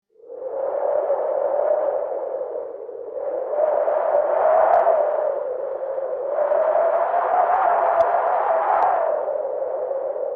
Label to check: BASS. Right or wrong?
Wrong